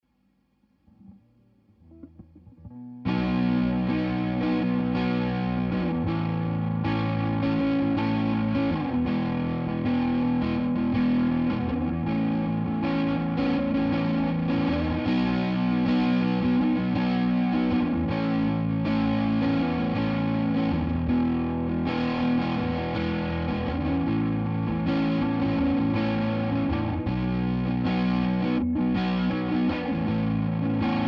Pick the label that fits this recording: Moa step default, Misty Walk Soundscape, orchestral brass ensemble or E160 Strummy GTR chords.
E160 Strummy GTR chords